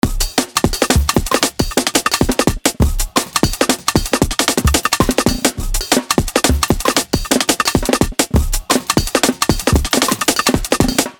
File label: DRUM & BASS BREAKS